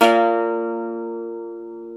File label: SAZ, Plucks; Eth Plucks, Pluck Duo